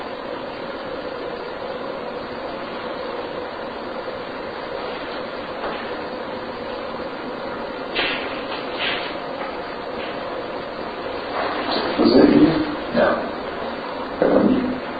2nd Floor Room Entrence to Attic Unexplained movements and sounds Amplified; Turner Home